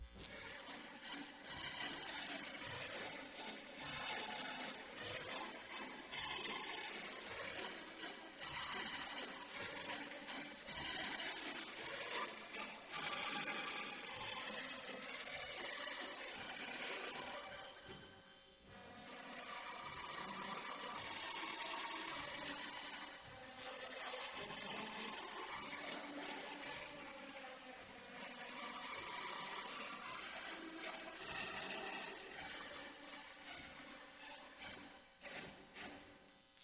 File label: Waltz; music to sell weyerhaeuser hardwood paneling